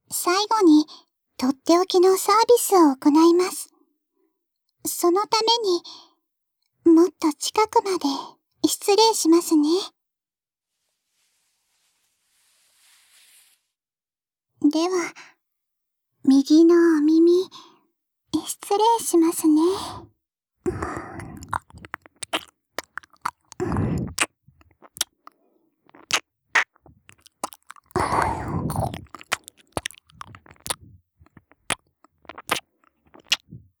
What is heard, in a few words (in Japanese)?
リラックス耳舐め